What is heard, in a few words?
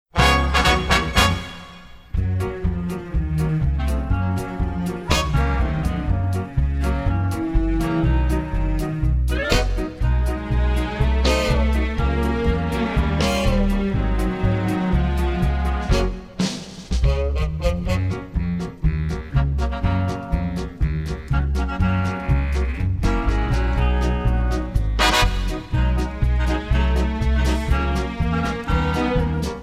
minus 2 instrumental